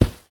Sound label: Carpet